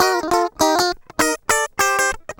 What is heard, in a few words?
GUI, licks